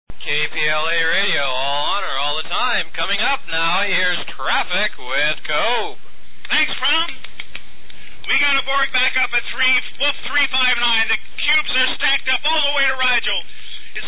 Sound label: traffic